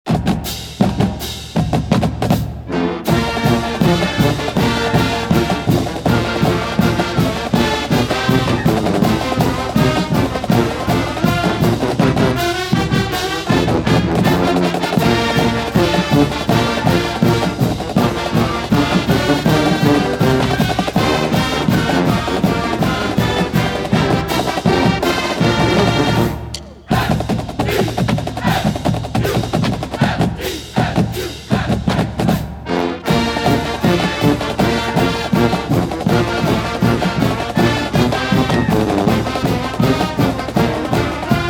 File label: fight song, athletic bands; sdsu